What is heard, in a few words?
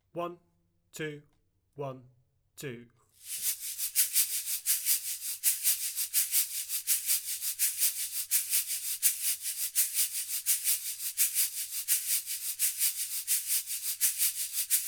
Samba Shaker